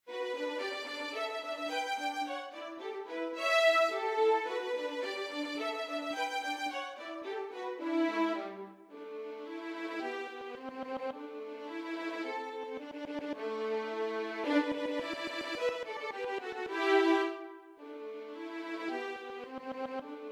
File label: Duo 2 violins